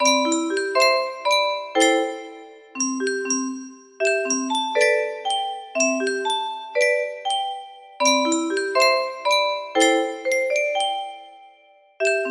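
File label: music box melody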